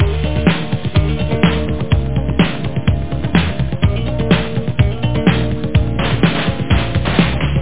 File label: SoundLoop; AmigaElysianArchive